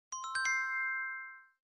correct

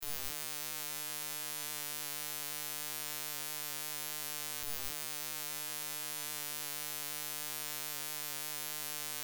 draw line

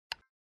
tab click

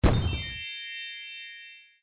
magic show box

